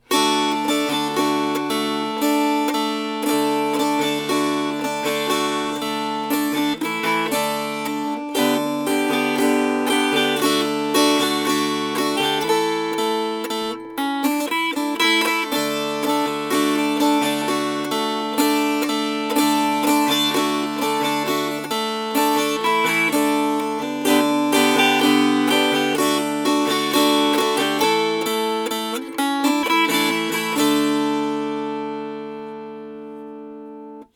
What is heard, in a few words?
Sow Weaving Silk Strummed